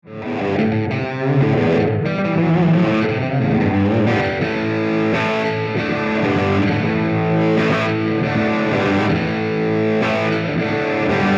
EMT Saw

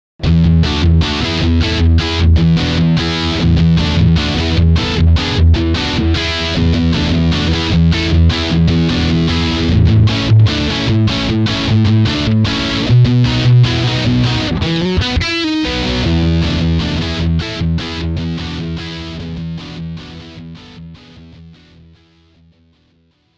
Classic Rock; VOX

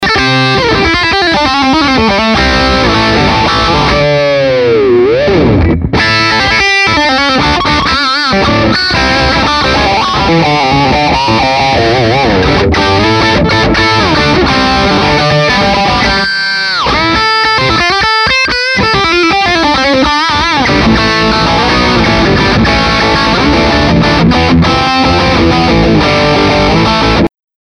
Plexi Tone 80s Hard Rock Lead; Carl Martin

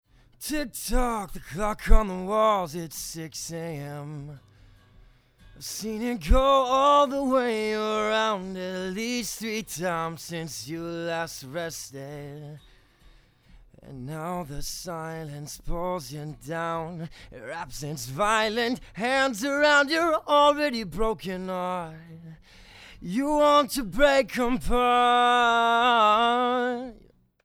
Vocal

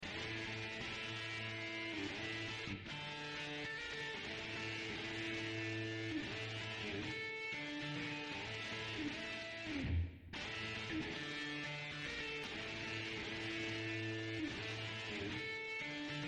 rectify

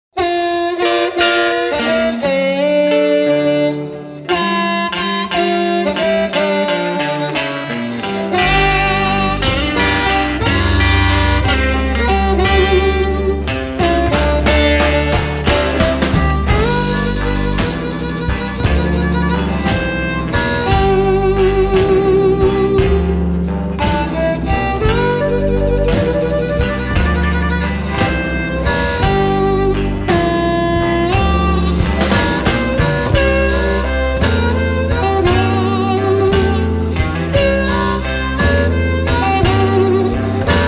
Hohner Big River; Dieter Kropp

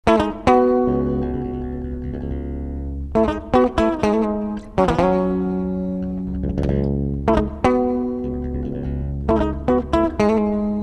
Hall Verb

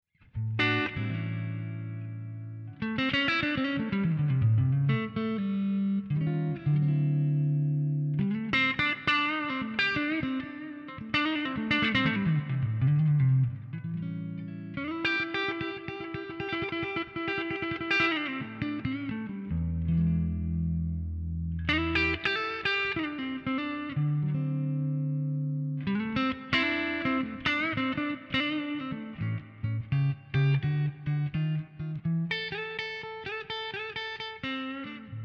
Black 2x12 Blues; VOX AD15-30

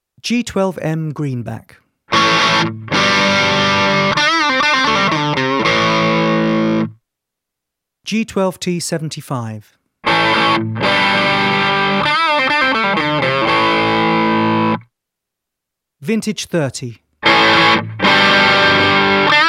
celestion comparison

